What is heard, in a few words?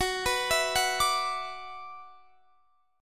F sharp 7th Suspended 4th Sharp 5th, Guitar, Standard; F#7sus4#5 arpeggiated